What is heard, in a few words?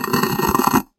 block push; Puzzle; puzzle mechanics